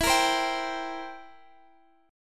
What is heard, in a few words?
C 7th E